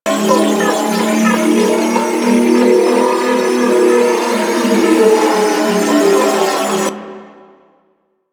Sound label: Data Pillar Loop, Ship; Ambience; Props; Warframe; Corpus Ship Remaster